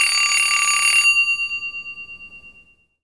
alarm clock; ticks

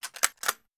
desert eagle reload, magazine

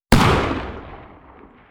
magnum exterior shot

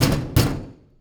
metal door pound; wardrobe

